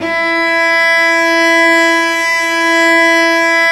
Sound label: STR Vc Arco p